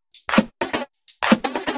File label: Electro Loop 128BPM; Vengeance Samples